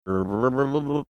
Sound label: making funny mouth sounds